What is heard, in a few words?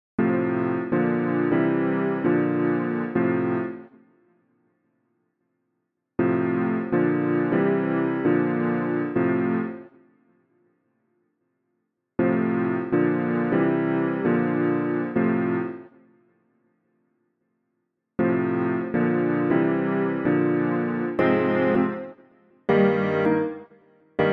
Piano